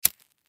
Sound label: Lighter Flick, Lighter Flick Start Flint Foley; Fire; Lighters